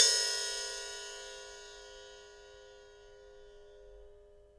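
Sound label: ride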